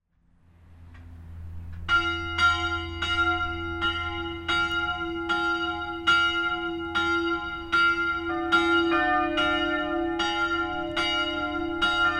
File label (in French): simulation à 5 cloches; Le Pâquier